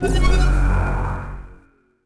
power Up

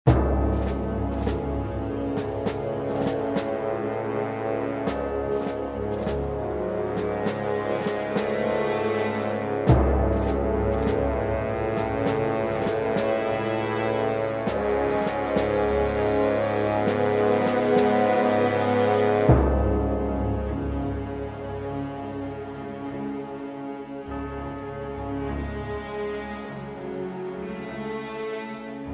air slow, music; Soldier Of Fortune II Double Helix; XBOX; airport

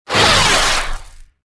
saber hit; weapons

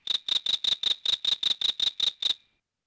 Frogs

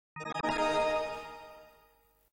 item get, music; Shadow Of Memories; XBOX

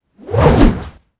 swish

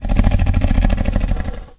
moan

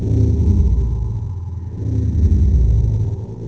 heart; The Elder Scrolls III Morrowind GOTY; XBOX

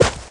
Bullet Dirt, Impacts

